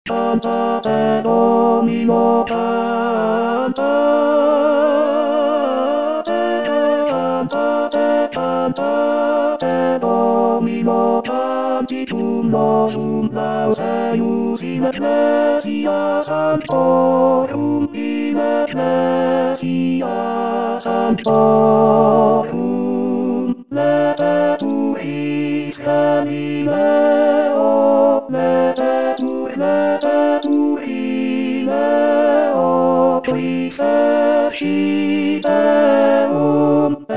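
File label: tenor; Cantate Domino; Pitoni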